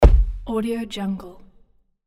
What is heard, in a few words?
kick; blackalexstudio